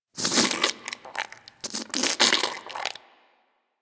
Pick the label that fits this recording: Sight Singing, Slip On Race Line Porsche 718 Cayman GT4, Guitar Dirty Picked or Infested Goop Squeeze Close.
Infested Goop Squeeze Close